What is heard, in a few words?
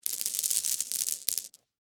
Marbles Set Down, Texture, Marbles, Set, Down, Handful, Slow; Miscellaneous Toy Sound Effects